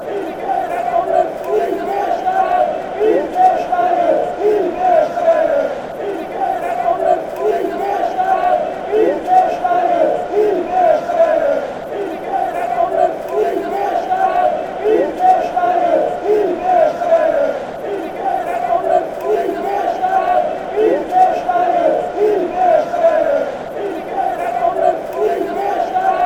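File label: austria chant, football songs and soccer chants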